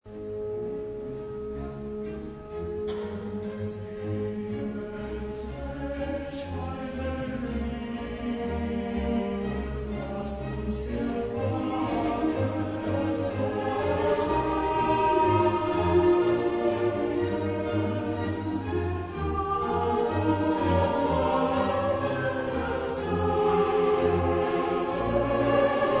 Oratorio, chorus; Mendelssohn; Paulus Op36; Sehet welch eine Liebe hat uns der Vater erzeiget

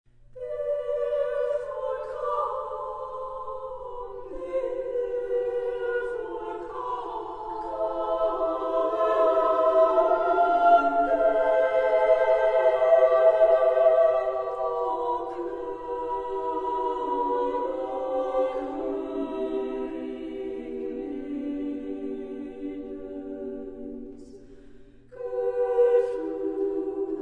female, chorus; Barber; The virgin martyrs

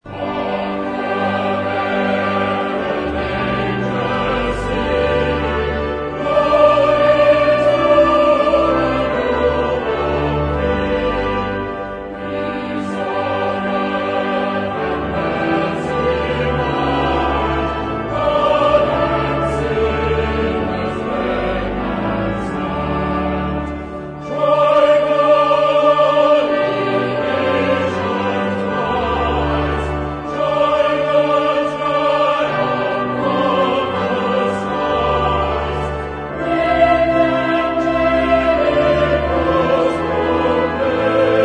chorus; Mendelssohn; Hark the Herald Angels Sing

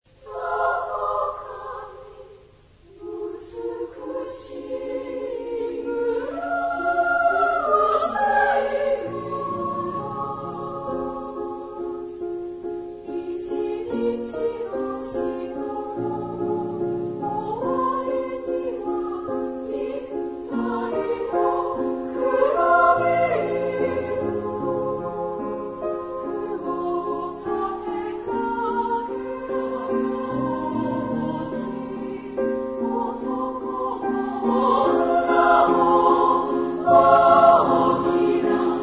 female, Pf; chorus

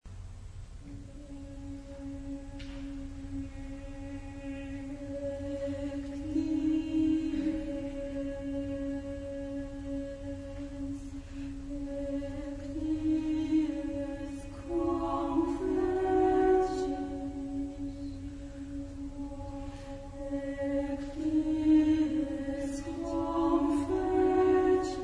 Haec dies, chorus; Matsushita

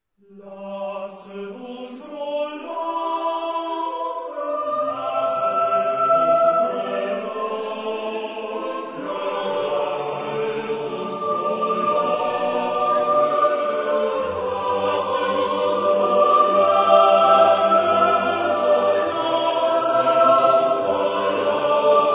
Anthems, chorus; Mendelssohn; 6 Anthems Op79; Lasset uns frohlocken

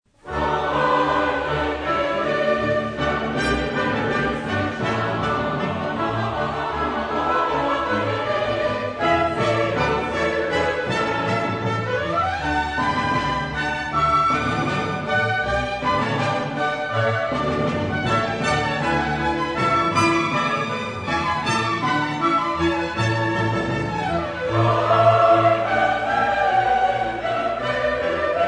Cantata, chorus; Bach; BWV30; Freue dich erloste Schar

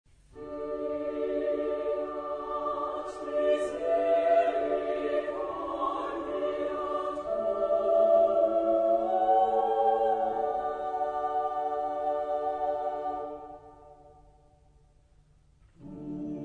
chorus; Mendelssohn; Te Deum; Fiat misericordia tua

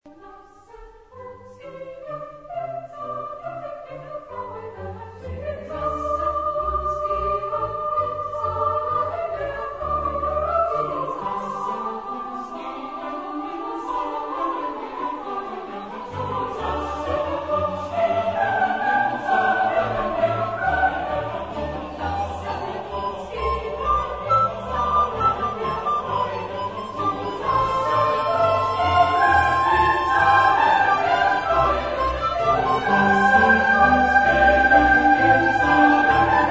Cantata, chorus; Bach; BWV182; So lasset uns gehen in Salem der Freuden

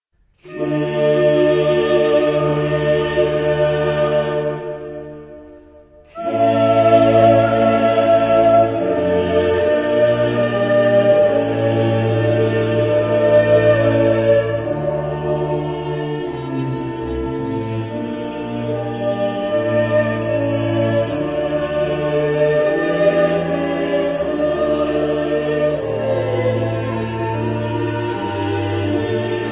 Missa a8 vozes Kyrie; Esteves

